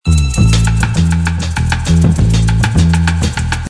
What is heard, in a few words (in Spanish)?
mediterraneo ritmo bajo; Canto